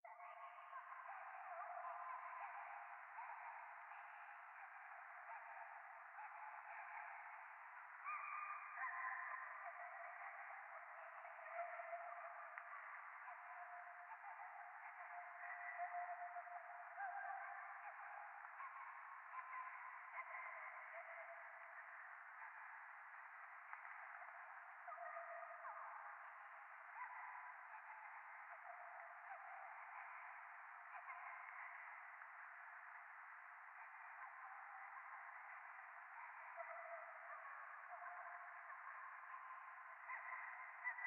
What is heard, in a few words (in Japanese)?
ゴースト 可愛い喘ぎ 雨